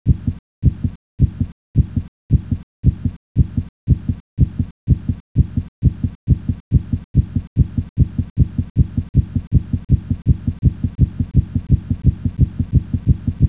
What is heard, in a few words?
hearbeats